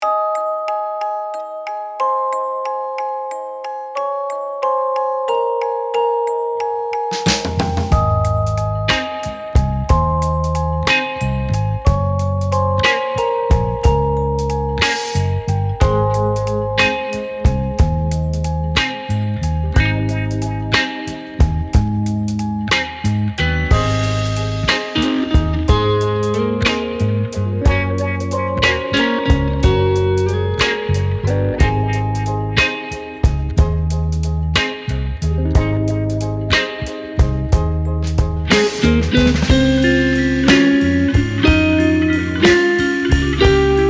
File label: SLOW; Girly French pop